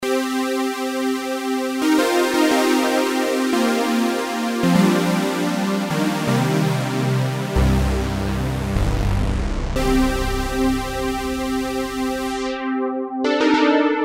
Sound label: ROLAND FANTOM JUPITER-8 Model Expansion